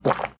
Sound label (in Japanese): goku